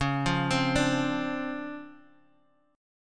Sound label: D-Minor 7th, C sharp; Dm7/C# Chord {9 8 10 7 X X} arpeggiated